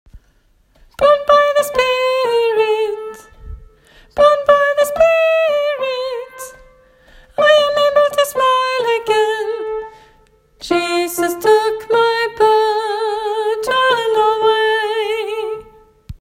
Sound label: tenor; åfjord